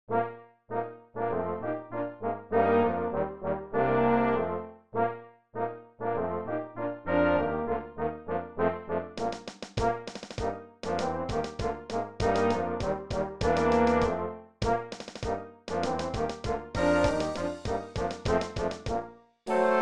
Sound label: Nutcracker Overture playback